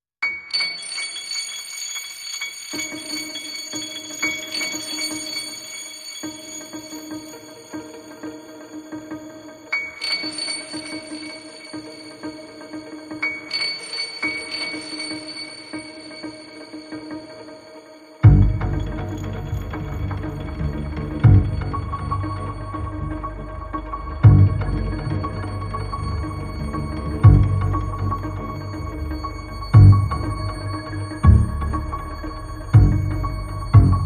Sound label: Morse Bass